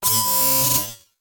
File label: hi tech electronic noise; sound-ideas